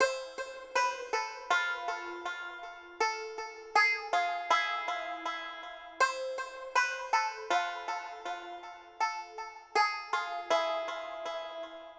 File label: Sitar, Hip Hop Exotica, Apple Loops; Big Fish Audio